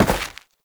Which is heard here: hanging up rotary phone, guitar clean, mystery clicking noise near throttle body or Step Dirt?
Step Dirt